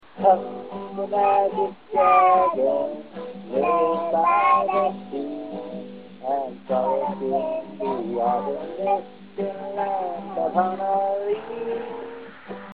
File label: me and girl 2 sing puff